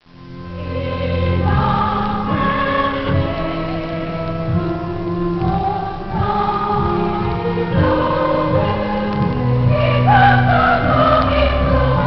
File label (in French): fugue, orgue; orgue cath oran; "Il a versé son sang", extraite de l'oratorio " Le Messie" de Haendel; thème apparenté au Kyrie du requiem de Mozart